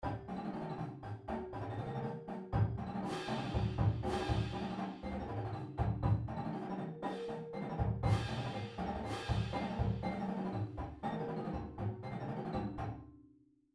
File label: Drumline Exercise, Street Singles; pdlmusic; Drumline Ensemble Series